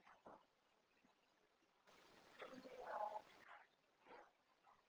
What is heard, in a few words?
Boiler Rm Hallway; SF3